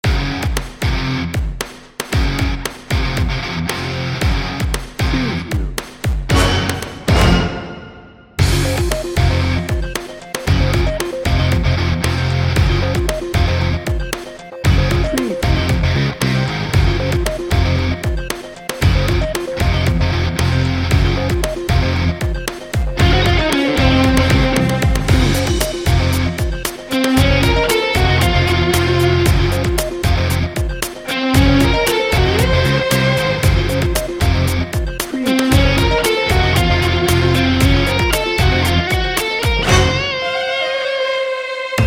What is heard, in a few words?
bollywood action hero